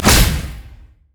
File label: deadly bloom; LoL; zyra